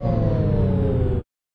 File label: POWER DOWN, gamebuzzer; sloodle; gaming